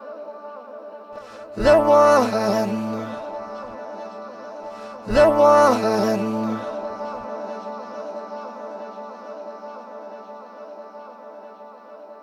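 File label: vocals loop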